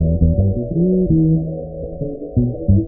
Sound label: bass, Loops, Phonky